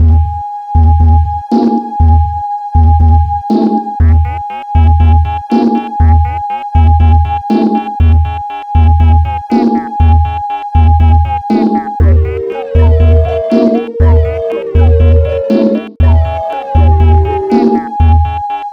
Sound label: Sinewave Alien Sonata